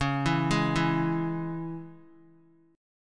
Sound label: C sharp Minor, Guitar; arpeggiated; chord; JGuitar